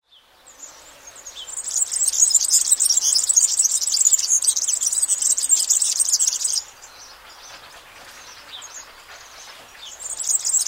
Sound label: serin